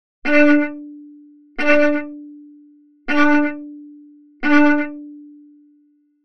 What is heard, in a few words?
Martele